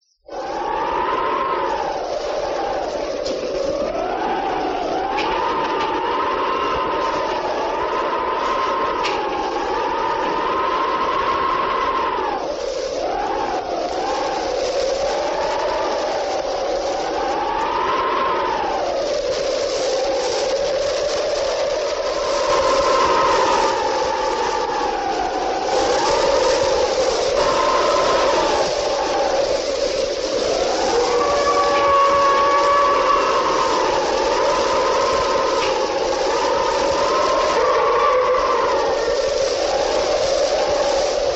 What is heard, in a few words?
Thunder Lightning Rain; Halloween 2005